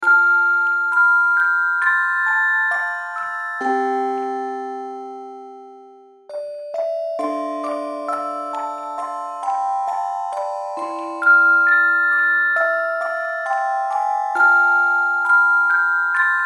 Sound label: Forgotten Lullaby Music Box Loop